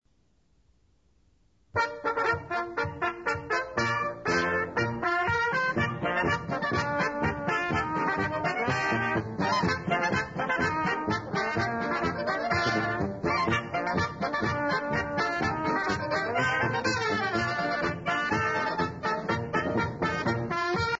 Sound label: MUSIC MUSIC POLKA